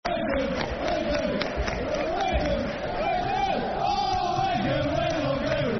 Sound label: Football Chants, Soccer Songs; We Love You Wigan; Wigan Wafc; fanchants